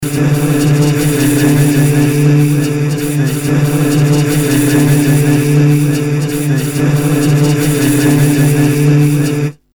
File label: Synthesized Loop Very Fine